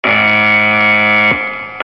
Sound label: buzzer; cstrike